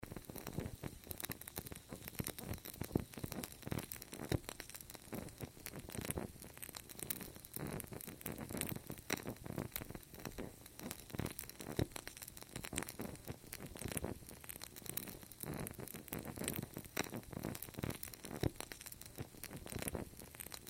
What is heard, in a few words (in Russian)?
svecha